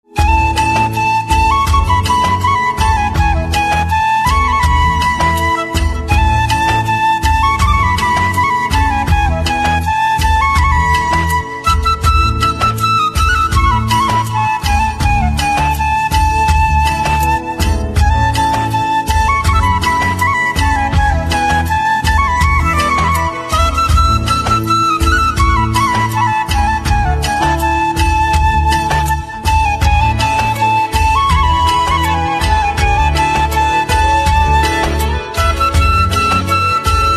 Flute Instrumental Ringtone

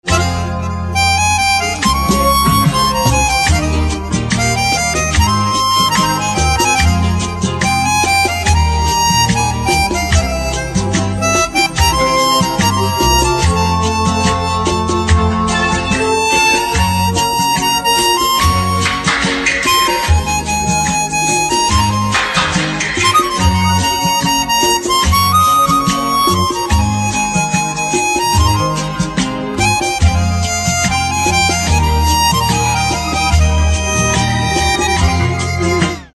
Pianica Instrumental Ringtone

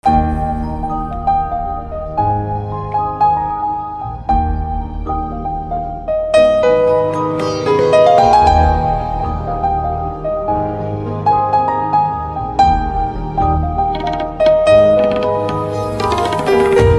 Piano Instrumental Ringtone

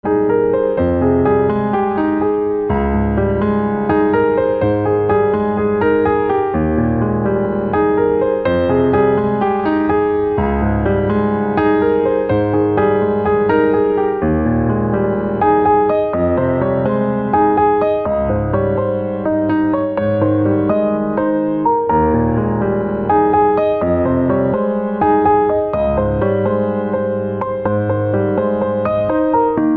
Piano Instrumental Ringtone

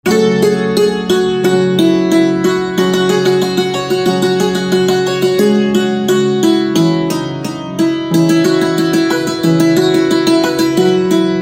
Santoor Instrumental Ringtone

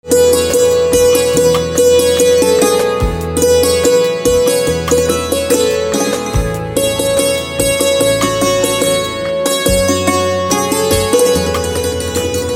Santoor Instrumental Ringtone

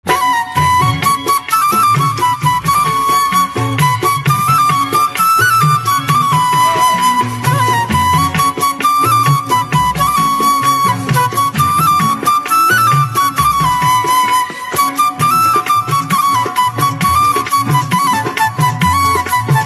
Flute

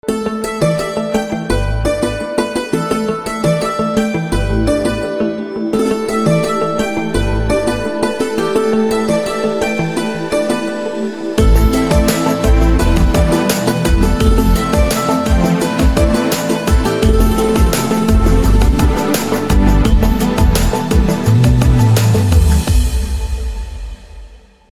Santoor Instrumental Ringtone

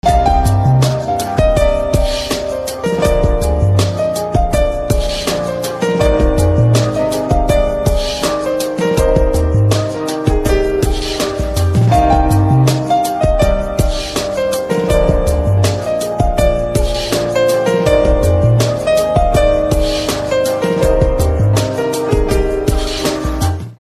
Piano Instrumental Ringtone

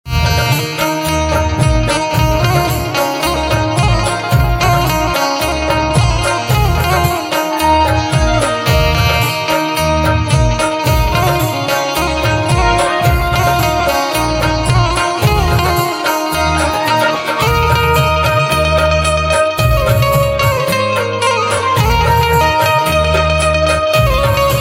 Banjo Instrumental Ringtone

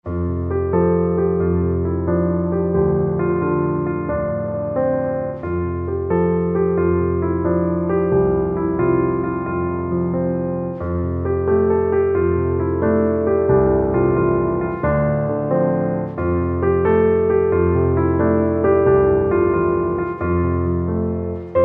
Silent Piano Instrumental Ringtone

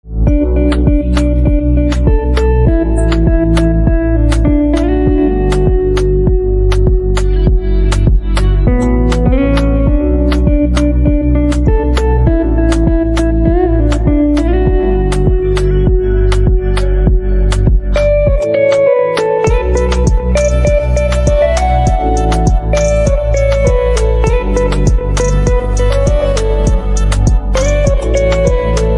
Guitar

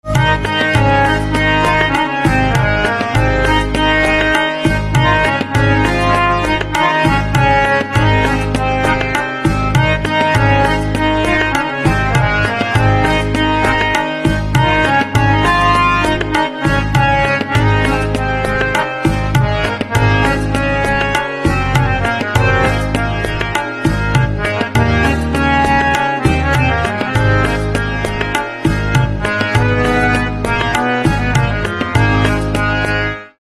Harmonium Instrumental Ringtone

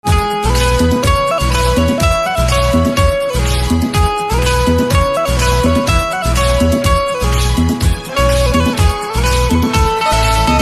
Banjo Instrumental Ringtone

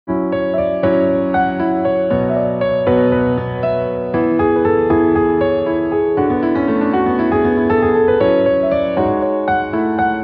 Piano Instrumental Ringtone

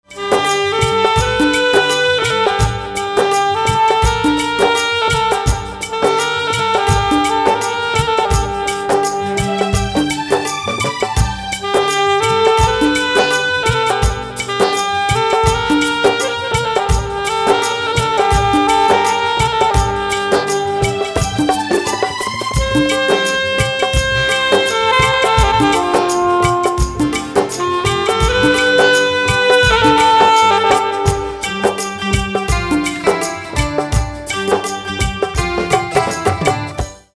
Saxophone ringtone

